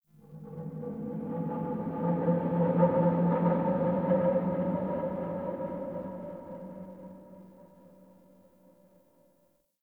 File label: wind blow; Warframe; Tau